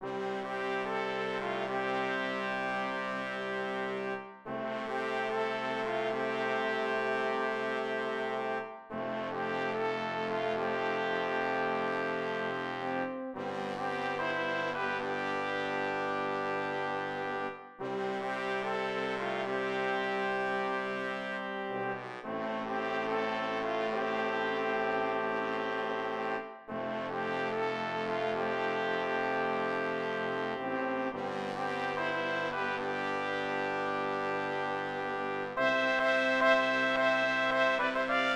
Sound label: Fanfare in C for Quint